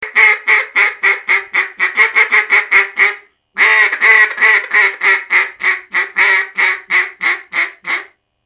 Mallard Greeting Call; Duck

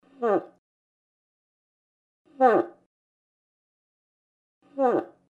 Bull Territorial, Moose

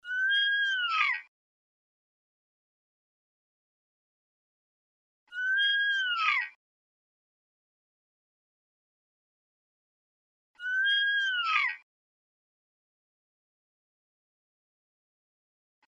Elk cow adult

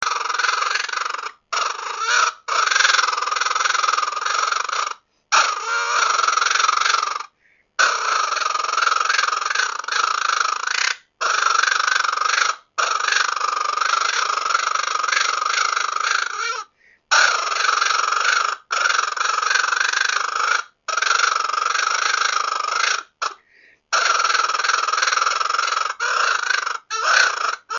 Raccoon Squall

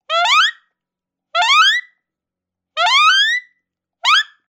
Fox female heat; Dave Kelso

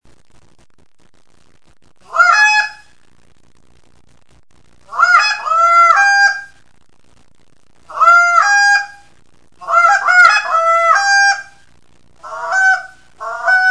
Canada Goose Call

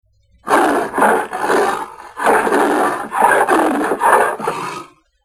Leopard; ORION

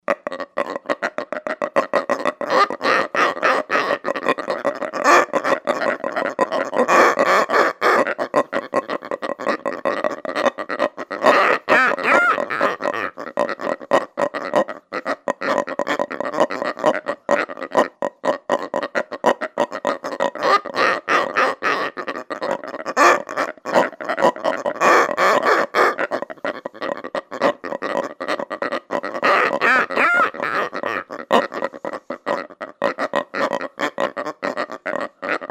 Hogs Feeding Frenzy; Dave Kelso

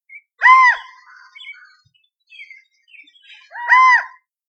Quail California; ORION

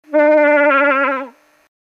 Old Cow In Heat Short Call, Moose

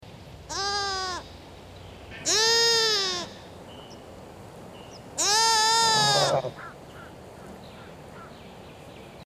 Lamb Bleats

